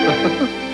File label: Giggle